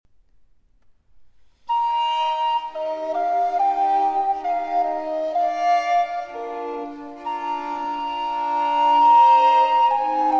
Sonata For Recorder, 2 Violins & Continuo in G Minor, Largo